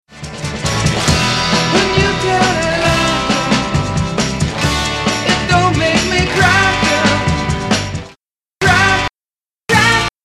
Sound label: Stab